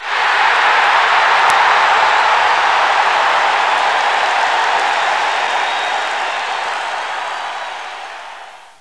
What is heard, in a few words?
HOMEGOAL; swos-soundset